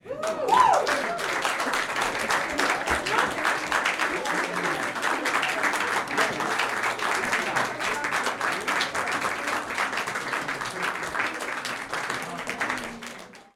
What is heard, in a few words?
Small audience claps yahoo yo